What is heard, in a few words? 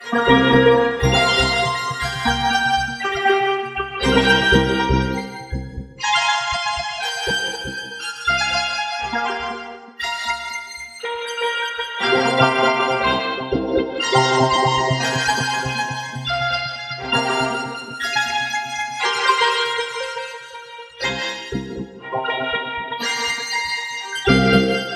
Cinematic Tension Builder; kaleidoscope